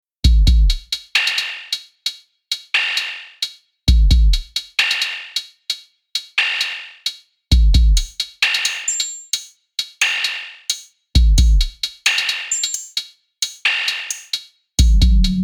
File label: drums asthmina; vco drums vol3